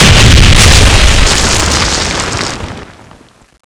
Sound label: laser guide exp, weapons